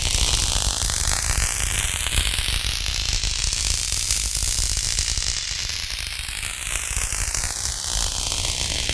mining sound; allegiance

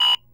missile lock on me; allegiance

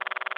switch weapons; allegiance

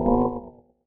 ui alert; allegiance

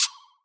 cancel; allegiance

